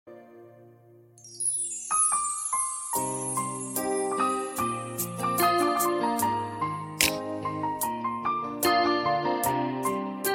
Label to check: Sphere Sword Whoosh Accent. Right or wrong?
Wrong